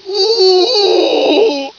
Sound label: alert; svencoop; aslave